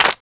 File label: hand clap sound b key; Mono